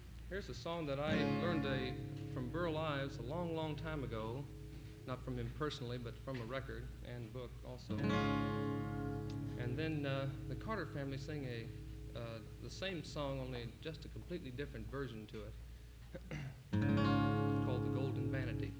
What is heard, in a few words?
Applause